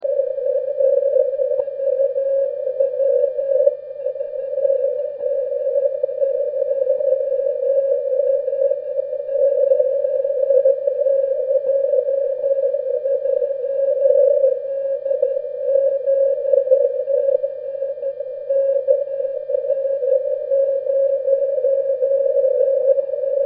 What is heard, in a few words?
160m beacon